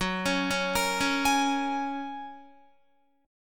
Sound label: F sharp Major Add 9th F sharp, Guitar; F#add9 arpeggiated; 13,16,13,15,13,16; 4F,4A,5C,5G,5C,6E; chord shape